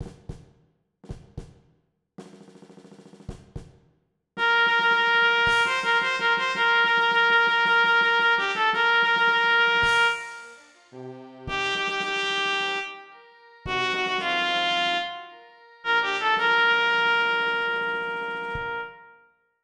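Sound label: trumpet 2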